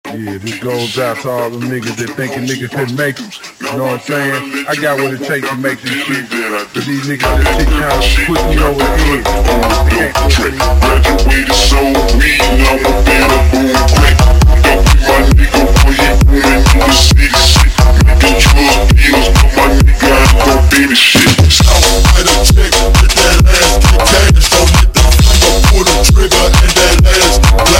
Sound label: Hardbass slowed, funk